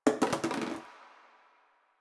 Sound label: Janitor Cart Plastic Bottle Drop; Warframe; Ambience; Nineteen Ninety Nine